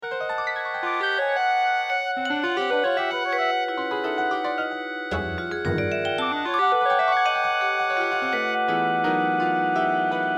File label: dectet; hollington; barnaby hollington